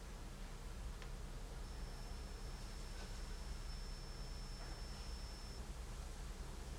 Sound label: noise sound